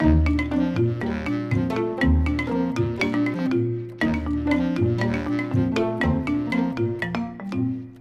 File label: music classic xylo; rayman origins 2011 gamerip; music world